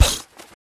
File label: Bird Black Destroyed; fowl